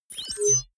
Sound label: confirm high tech beep, signale; soundpool